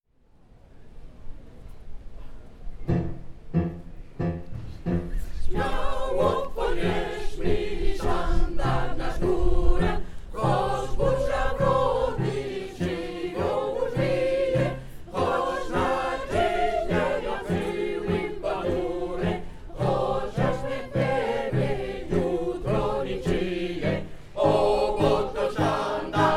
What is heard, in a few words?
Warszawianka session